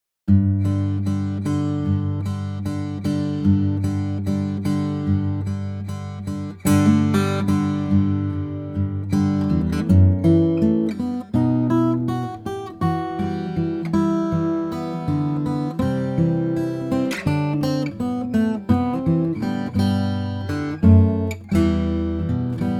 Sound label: guitar OM